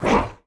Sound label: Sword hit; DevilSword; KardaMahal Underground Temple